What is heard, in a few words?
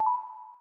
Unlock, phone ui; Xiaomi; Redmi 2